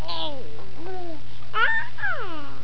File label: Baby; People; Sound Library 2000; Wayzata Technology